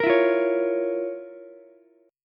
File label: F Major 7th Suspended 4th Sharp 5th down Ukulele; FM7sus4#5 strummed; JGuitar